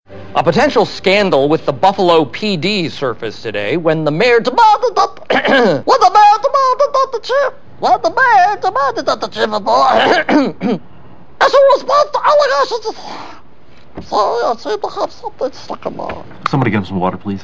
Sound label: High Pitched